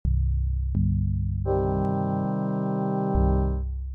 judicial timpani brass

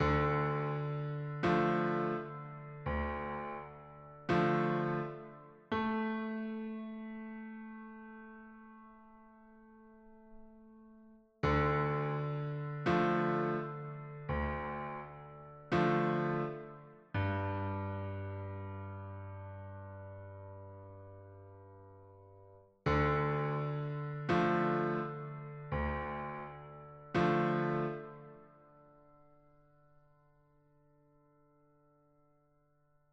b fifth drone descending

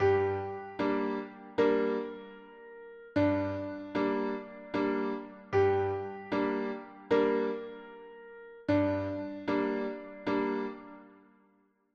a firm judicial cadence

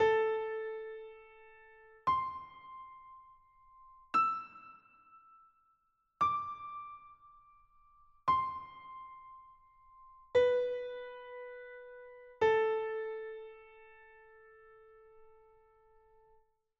b warm minor shift reflective

